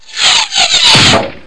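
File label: arrow hits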